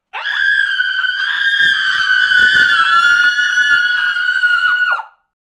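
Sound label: visg jenshin, woman scream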